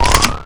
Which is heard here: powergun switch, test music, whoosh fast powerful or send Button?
powergun switch